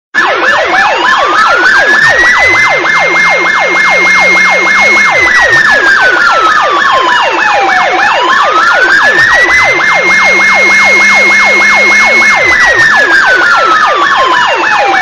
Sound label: Siren